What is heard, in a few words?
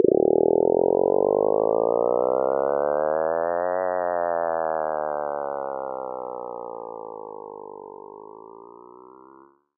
Buzz